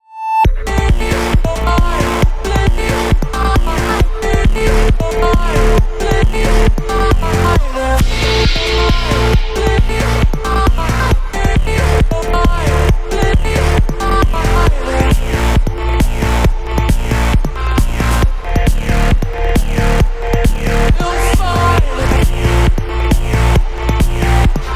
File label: shutdown; Cherax Destructor